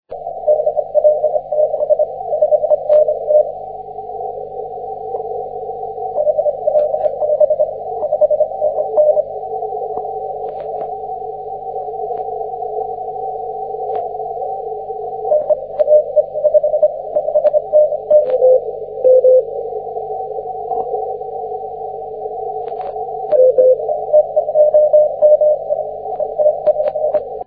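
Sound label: 30m continuous wave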